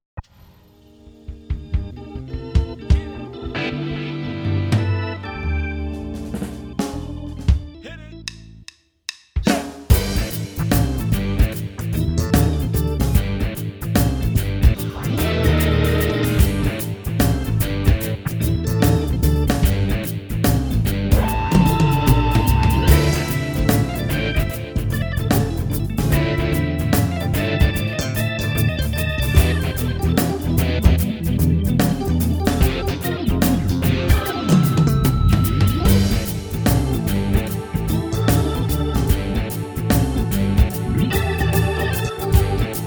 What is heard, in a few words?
Rock Organ